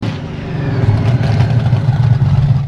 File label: Powerdown; Monster Truck Destruction; Android